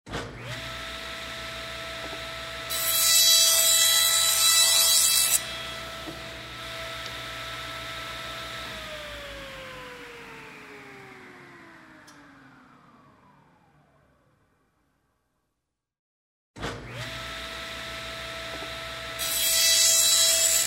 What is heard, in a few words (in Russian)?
otreznoj stanok pila